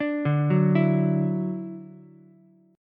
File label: D-Major Add 9th D down Ukulele C6; arpeggiated; JGuitar